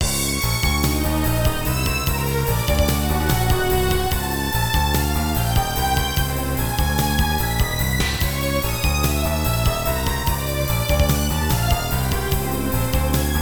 Battle (SC-55); Thatcher; Terra Nova: Strike Force Centauri; MS-DOS

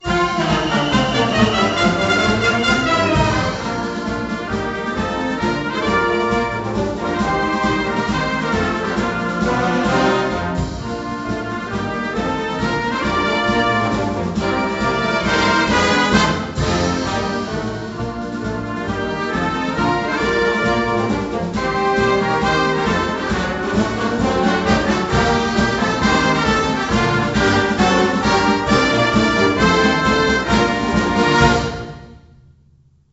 Fight Tune; NCAA March Madness 99; PS1

